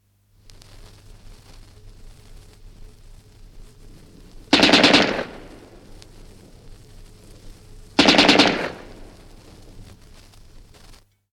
Two short rapid bursts of a Bren gun (from 78 disc); Machine Gun; BBC